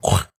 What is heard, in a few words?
eat, creature